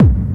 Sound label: TEKK BASE, DRUMS PERC; Q Up Arts; Dream Experience